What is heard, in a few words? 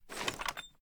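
sniper reload empty fast raise; gmod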